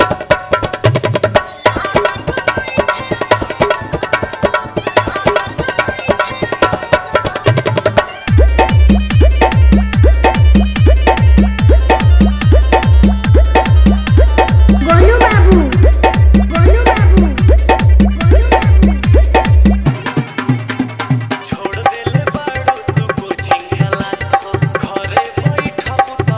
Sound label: New Bhojpuri Dj Song